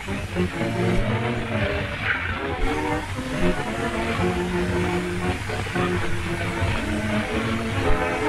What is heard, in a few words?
Noise, Hiss; Hiss Noise Removal